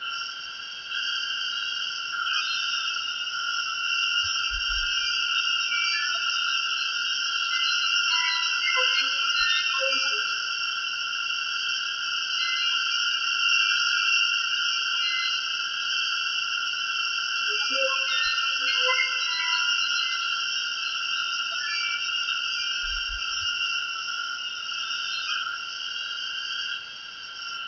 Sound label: brain drain machine, ambience